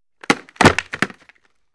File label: Football Helmet Pla, football